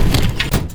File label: file slide, percussive